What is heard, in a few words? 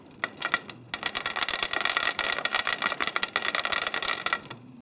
fine coffee grounds falli; grinder